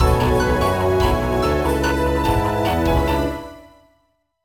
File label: BGM STAGE BATTLE; Phantasy Star Zero